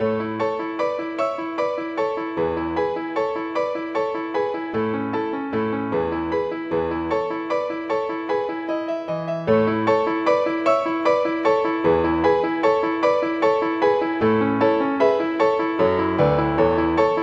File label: Piano Sonata; Variation 4